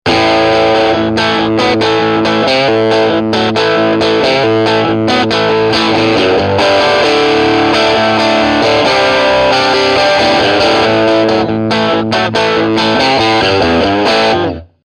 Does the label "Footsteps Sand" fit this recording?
No